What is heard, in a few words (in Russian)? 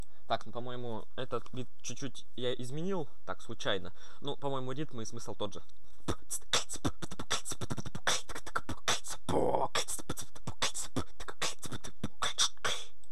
битбокс; Моя не перебитовка; b t kch t b db b kch tk dbdb kch tt dbdb b kch tk bВАВАВ kch tt b db b k tk b ttt kch tt b